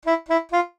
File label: jingles SAX; Kenney's Sound Pack; Music Jingles